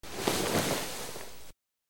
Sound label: Cloth